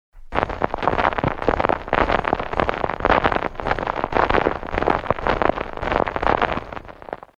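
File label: walking on snow Texture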